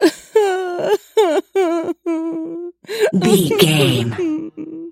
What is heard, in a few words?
voice female cry